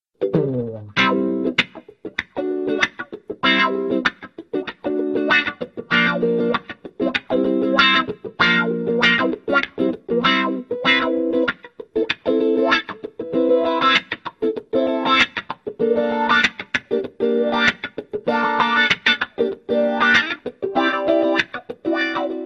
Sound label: wahwah, acoustic electric